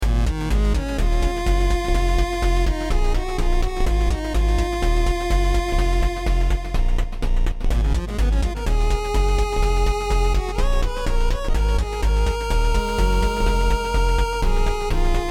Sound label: deep sea, Underwater chiptunes